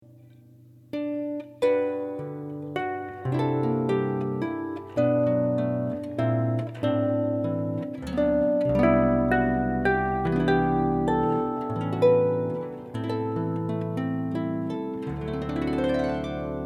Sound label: Christmas Carols, harp